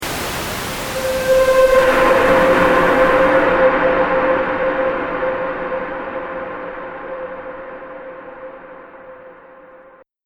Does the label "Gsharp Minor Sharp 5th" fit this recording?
No